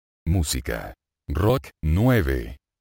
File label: rock